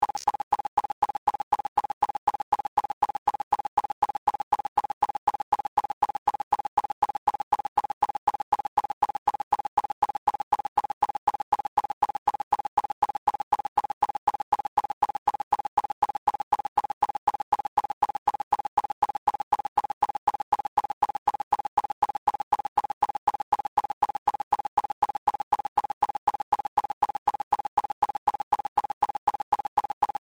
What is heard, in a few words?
sign wave